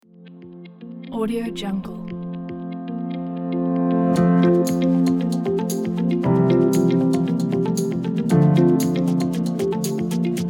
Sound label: inspiring presentation